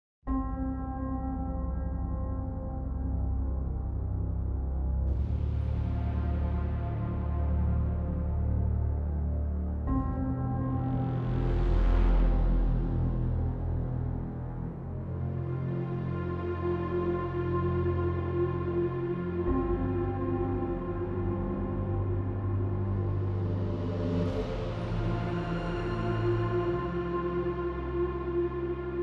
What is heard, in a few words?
Action Orchestral Trailer; Epic Orchestral Hollywood Trailer